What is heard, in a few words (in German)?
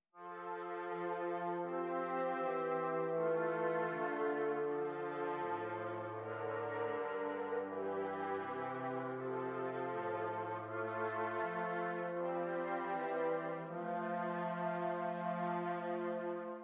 Stimmiger Contrapunkt; Ronge